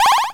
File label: win; AwesomeRPGGameDeployment; html5game